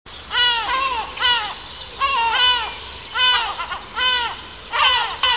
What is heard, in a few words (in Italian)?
gabbiano reale